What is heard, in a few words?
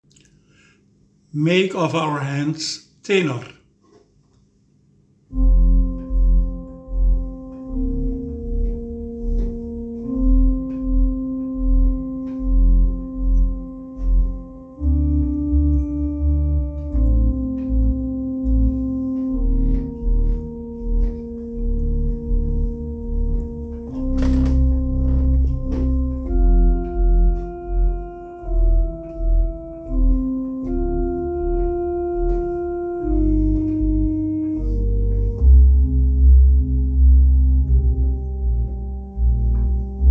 Tenor